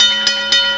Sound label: lift bell